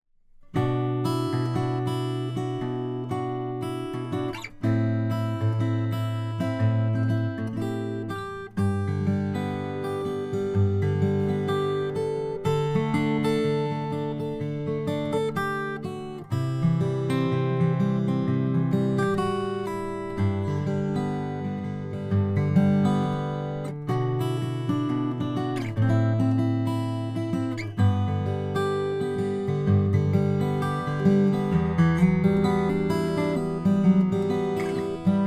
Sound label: langejans rgc 6 indian rosewood